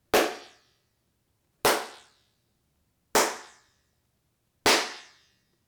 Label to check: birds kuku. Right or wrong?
Wrong